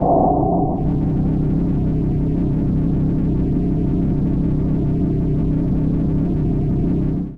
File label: Deep Space, sci-fi atmos